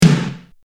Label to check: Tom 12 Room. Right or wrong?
Right